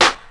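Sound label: snare clappy, Yamaha Motif ES drum machine; 300 Drum Machines; Yamaha Motif ES-Series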